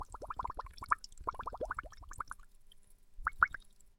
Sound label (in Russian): bulkane lekarstva v shpritse